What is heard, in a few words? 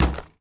Street Ball Glass Basket; SFX Kit